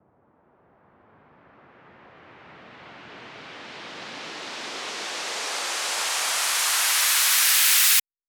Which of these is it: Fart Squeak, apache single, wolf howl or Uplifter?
Uplifter